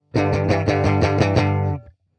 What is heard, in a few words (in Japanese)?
ボトムリフ